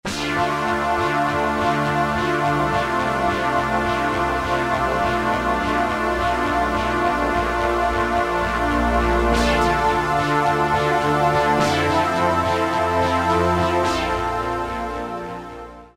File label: CS-Sixty BPF, vintage synth; Roland SR-JV80-04